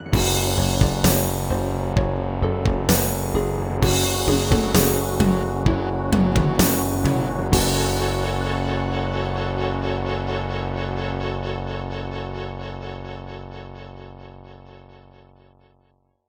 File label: LG MIDI ART GS1000R; Doom (Sigil); Introduction